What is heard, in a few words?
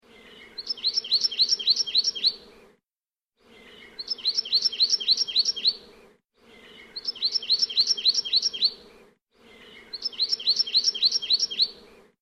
Akepa; Hawaiian